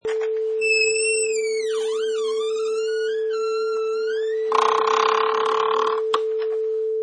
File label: Creaky Door